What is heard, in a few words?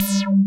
PULSE M BS, TECHNO; Pro-Rec - Synthsonic; Z1 TECHNO